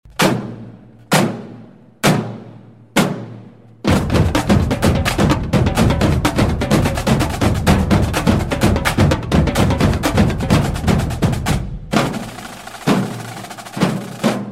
best drum beat

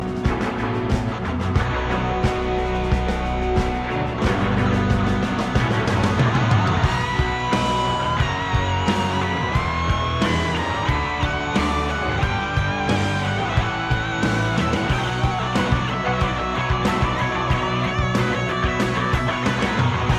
great solo

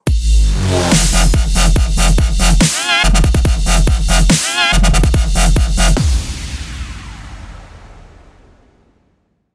dubstep

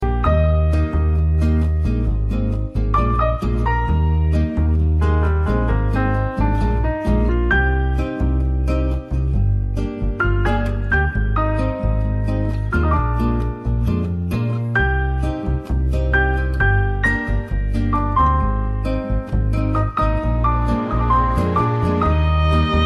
piano and guitar

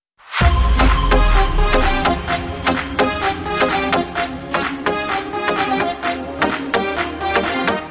dance sms

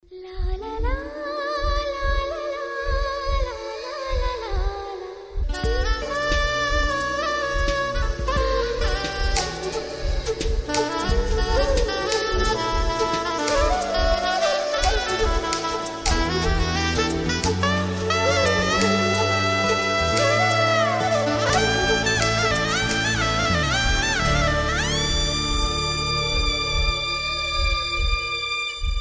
lullaby saxophone

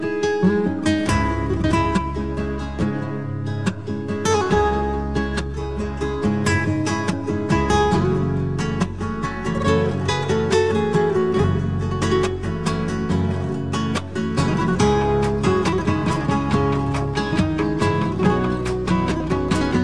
beautiful turkish guitar

